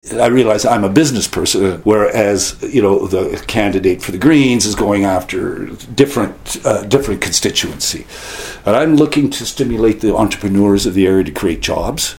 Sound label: Tom